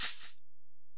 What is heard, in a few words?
Ac Perc, Drum Machines Alesis DM-PRO